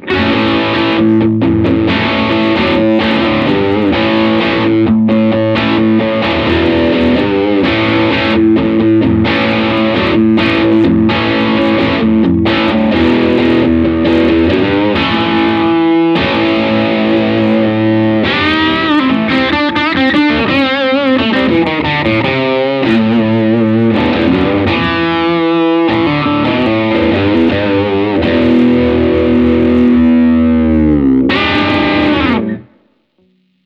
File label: Blackstar St. James Comb DI Stereo; Architect Software